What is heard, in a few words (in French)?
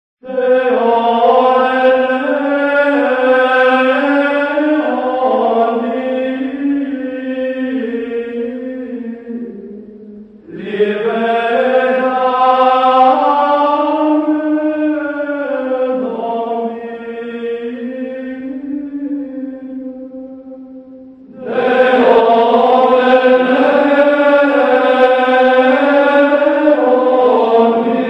Chant gregorien Voix egales d hommes; office de Pâques à Silos; De Ore Léonis